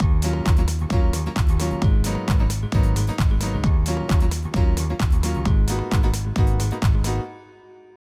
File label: dance